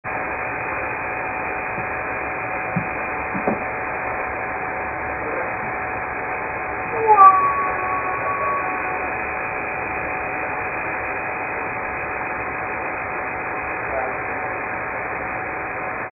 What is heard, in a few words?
Strange Sound